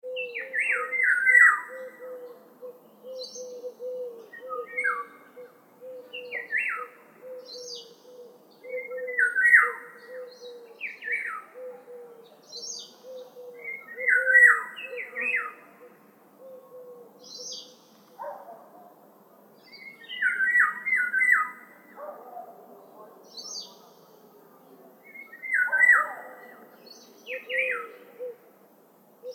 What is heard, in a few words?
Birds singing relaxation